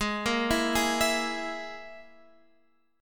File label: G sharp Suspended 2nd Sharp 5th, chord; x,23,20,21,20,23,x,x